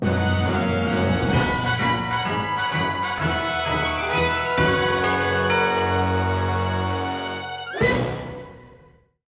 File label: Jingle Picpayoff; My Little Pony Color By Magic; android